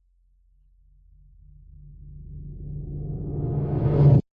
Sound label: riser dark quick metallic reversed; sound design